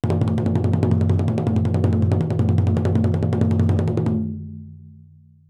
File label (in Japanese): 連打 長胴太鼓; 膜鳴楽器; 打奏